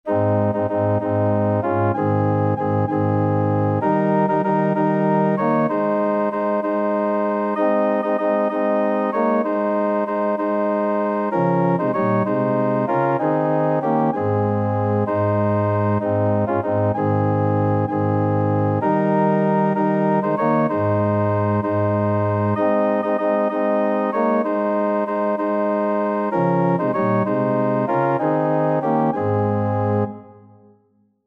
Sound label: organ